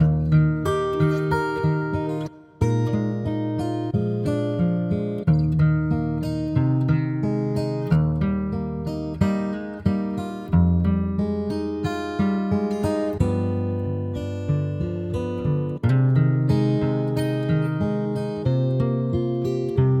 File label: Relaxing Spa Music, Cheerful Ambiance for Spa Hours